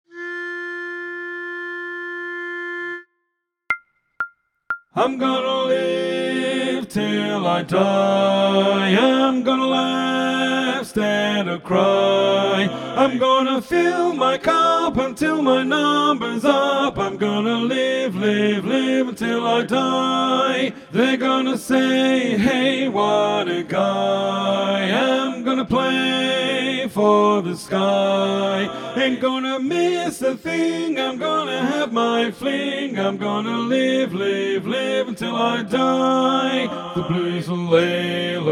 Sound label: lead loudest